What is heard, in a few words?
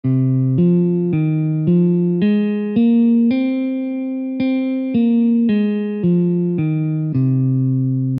scale; scales of India